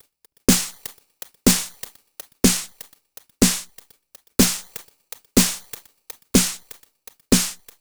Pan Loop, Drums & Percussion, Electronic; Sonic Foundry (Sony Creative Software) - Electronic Point Blank

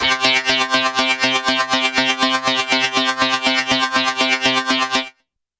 Trance, YAMAHA RM1X

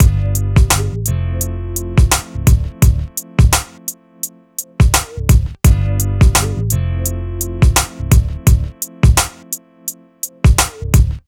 MIX, Hip Hop and R&B Drumloops; Best Service; ProSamples vol.01; AKAI

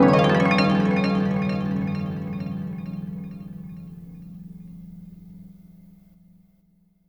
Harp Trail

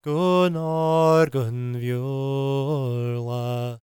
GAELIC, World Voices; ILIO; Vocal Planet

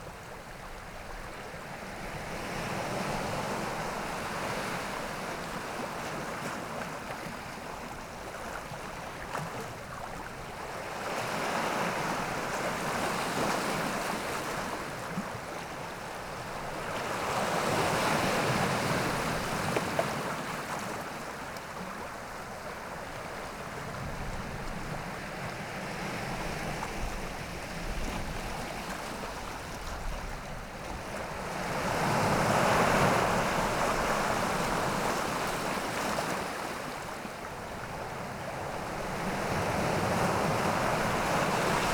Calm Water Wave On Th, water sounds; WATR Wave

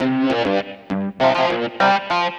Metafunk, Leads And Riffs; Sonic Foundry (Sony Creative Software); Synchro Funk

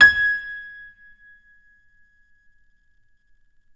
PNO FF; Synclavier Sampler Library

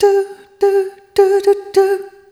Rhythmic G

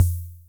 Kick OHH, rb-1; classic machines

